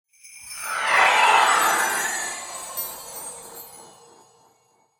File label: Christmas whoosh transition